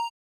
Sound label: speak